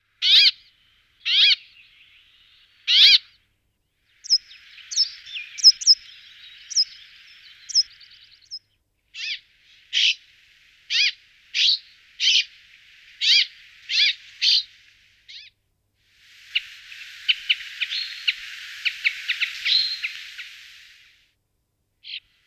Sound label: Fringilla montifringilla, passeriformes